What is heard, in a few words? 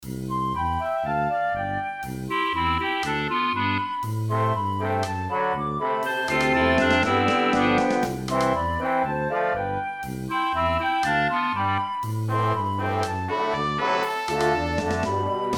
cheerful march